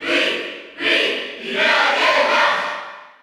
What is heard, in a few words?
Cheer Spanish; Pit; SSBU